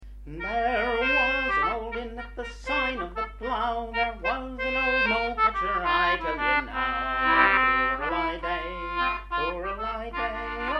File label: Concertina; Molecatcher